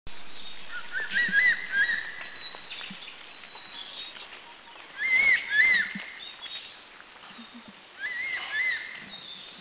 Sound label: Crested Serpent Eagle Bedongyueshan; Spilornis cheela hoya; Taiwan; Fairly common and seen throughout.